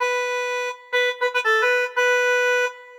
Sax; life is a game android

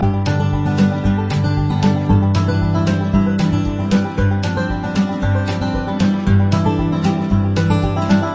Catching Fish Loop; Hay Day; android

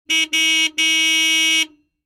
beep

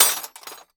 TOOL Spanner Into Toolbox

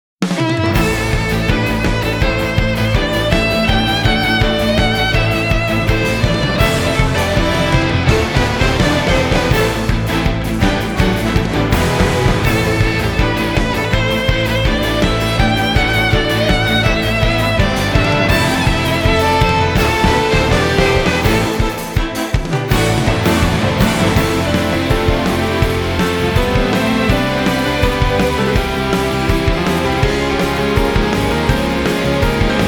Battle; Octopath Traveler Tairiku no Hasha

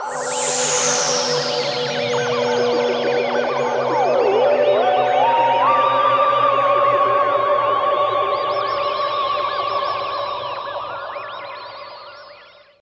Course Intro Fanfare; crash bandicoot series sound box; Uranus' Mine

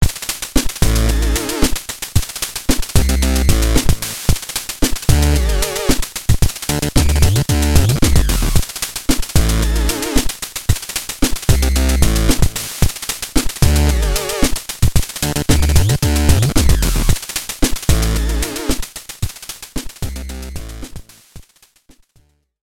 Unlock New Power; Regular Show Mordecai & Rigby in 8-bit Land

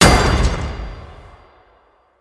Menu New Rank Impact; aqua moto racing utopia ps4 switch wii u windows xbox one gamerip 2016

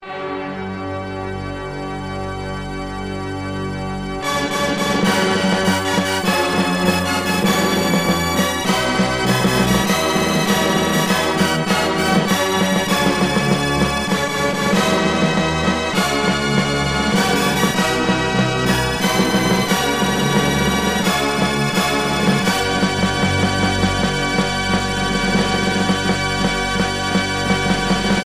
Victory; J.League Soccer Prime Goal 2; SNES

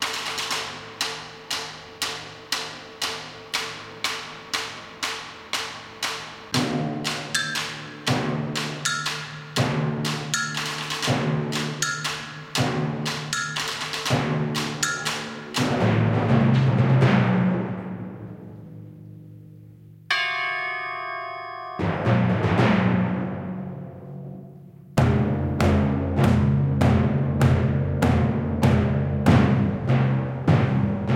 Percussion Stingers; Turning Point Fall of Liberty

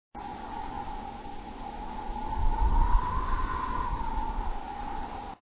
SFX Desert Wind

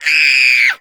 Boy 6yo Scream; mono; Don't Be Afraid The First Toy

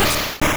Rolling Kick; sfx; attack moves; pokemon; gen 1; rby

